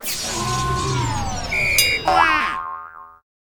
phase fail

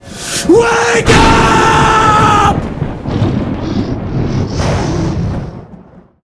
wakeup

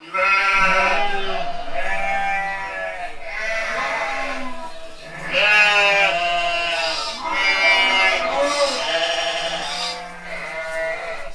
sheep pen

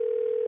ring

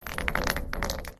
grenade, combat

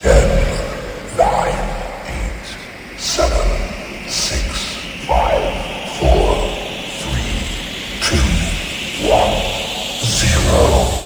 timer; CosmicRage; combat

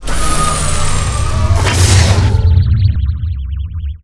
probe return; CosmicRage; star study

